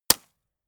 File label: gun shot silencer close